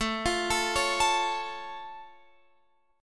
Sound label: A Augmented A, Guitar Open F; arpeggiated; JGuitar